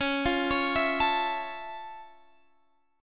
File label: F Augmented Major 7th C sharp, guitar chord